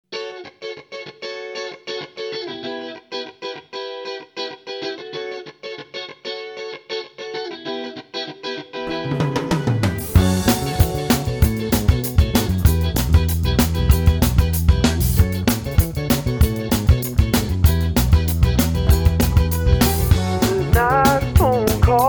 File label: Ska